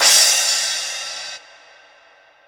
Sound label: single cymbal hit f sharp key